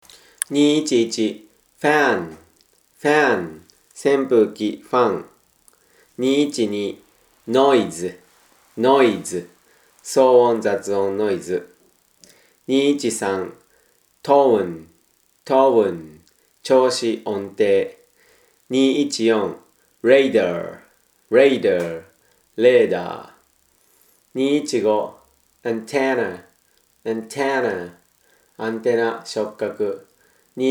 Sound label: tango